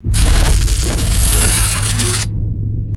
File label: rapid electrical spark flutter and pass by; electricity